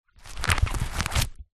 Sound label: sport goalkeeper glove remove